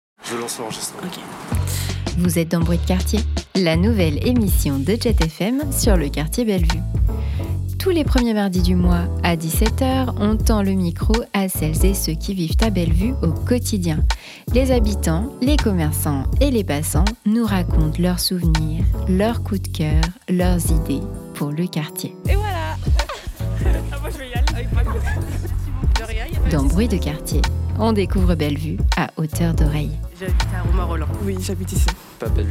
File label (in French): Générique d ouverture; Bruits de Quartier; Habillage